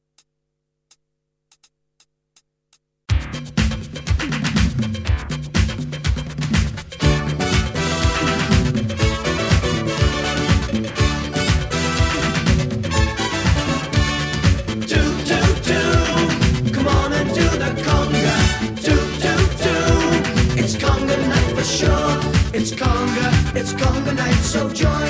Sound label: Party Song; backing track